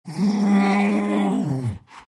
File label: big moans; Dota 2; Undying